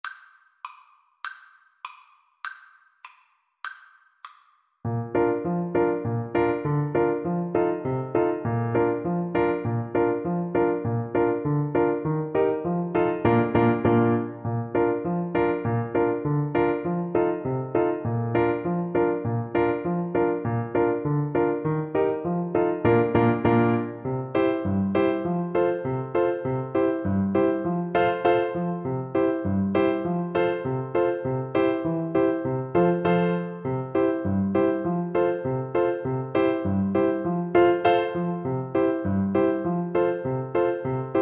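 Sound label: pincushion polka alto sax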